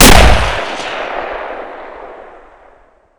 Inf Weapons; Red Orchestra 41-45

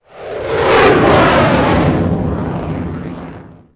Jet Command Patrol; Civ4 warlords

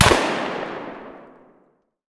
mr43 double fire far; Escape from Tarkov

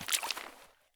prone puddle; Escape from Tarkov

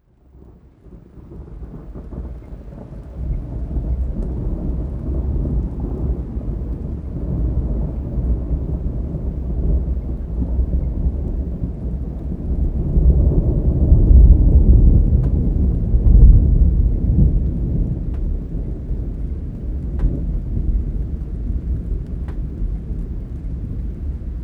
thunder med; Rust

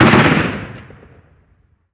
gun 50cal med

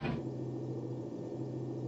Aircrafts P-51D Gear Tail Loop; DCS

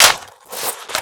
sa80 reload clip out, foley

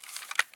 weap hand on; Escape from Tarkov

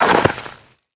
foot snow deep, footsteps; Call of Duty Modern Warfare

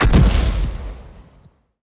impacts; World of Tanks

